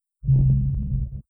Windows 0.0-0.21 Startup; Windows Never Released Soundpack 1

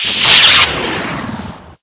PDA Startup; Windows PDA 3.0 Startup; Windows Never Released Soundpack 1

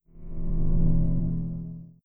Windows Startup & Shutdown; Windows Never Released Soundpack 2

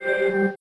Windows 3.08 Beta Shutdown; Windows Never Released Soundpack 2

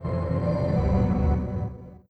End Shutdown; Windows End Shutdown; Windows Never Released Soundpack 1

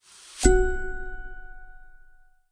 Ubuntu 18.10 Shutdown; Linux

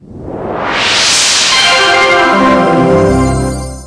OS2 Warp 4.0 Shutdown; Windows Never Released Soundpack 2

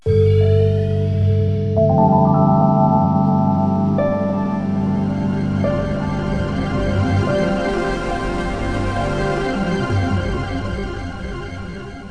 Startup; Windows NT 95 Startup; Windows Never Released Soundpack 1

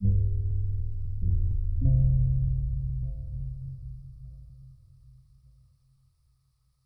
Windows Server 2119 UK Startup; Windows Never Released Soundpack 1

